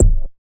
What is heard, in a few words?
KIKI, Drums; Distored Reality 1&2